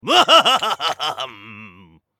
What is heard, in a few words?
Character Shaman Cheer; Angry Birds Epic